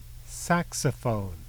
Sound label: saxophone